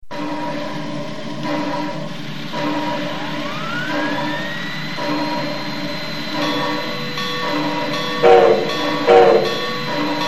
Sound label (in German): US Dampflok, Gartenbahn; Piko Gartenbahn G; Piko 38242